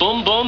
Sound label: BOOM; He Said, She Said